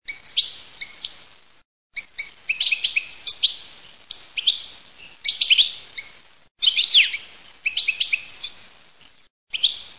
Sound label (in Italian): cardel in cardellato, birdsound